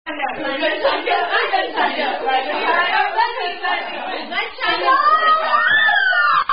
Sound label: Korean Drinking Game; Bunny Bunny